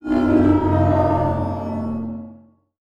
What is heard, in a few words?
Multimedia Internet CD-Rom Flash Wipe